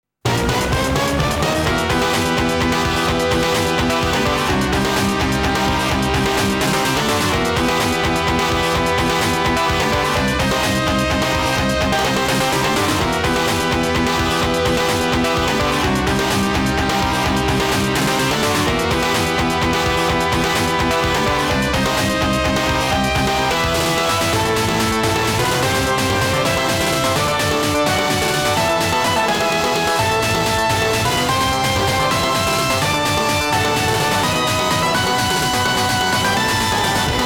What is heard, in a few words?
BGM